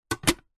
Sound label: household plastic plate set down stack on another; zapsplat